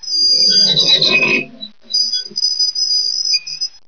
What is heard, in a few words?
squeaky wheel